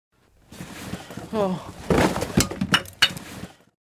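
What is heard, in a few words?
fainting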